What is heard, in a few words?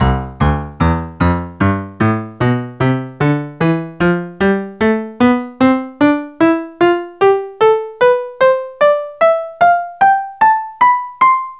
piano